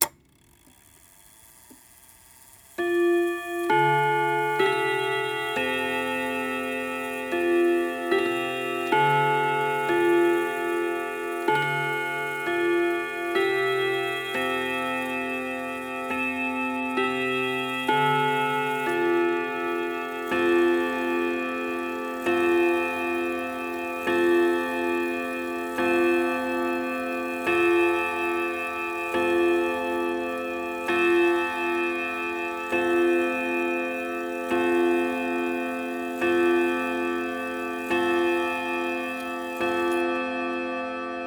seth thomas clock strikes 12 near end of cycle (recorded with ms mic)